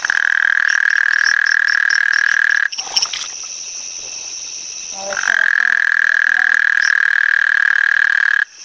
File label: Incilius valliceps, bufonidae